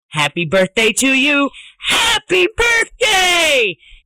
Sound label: Happy Birthday Ringtone Funny